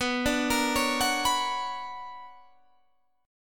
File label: B Minor Major 9th B, chord